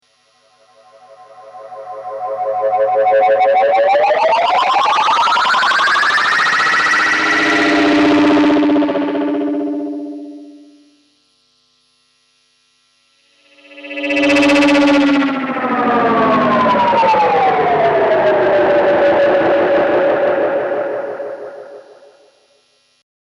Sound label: Classic FX noise Galaxy Tape Echo Ufo up down